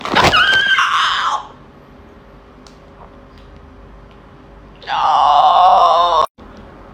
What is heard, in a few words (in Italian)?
urlo